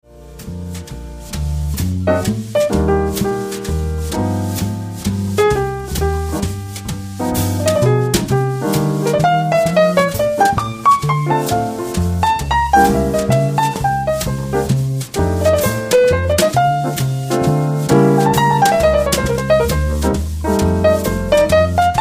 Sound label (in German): Ensemble Hoerbeispiel